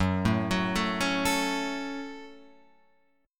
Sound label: Gb Minor Gb Guitar Open F; arpeggiated; JGuitar